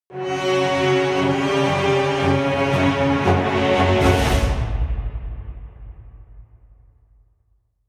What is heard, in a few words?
medieval jingle orchestral negative